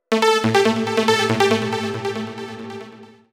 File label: TECHNO SYNTH 140; Keys